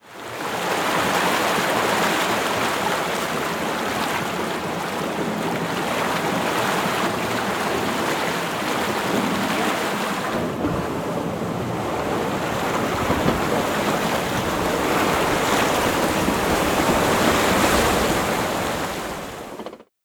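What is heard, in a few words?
Hamburg Port ambience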